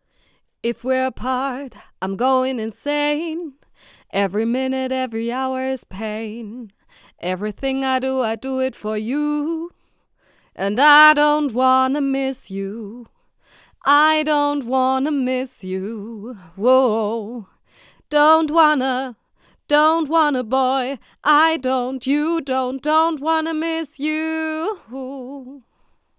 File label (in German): gesang f; akg c3000